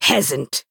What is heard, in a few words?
attack; beyte fyr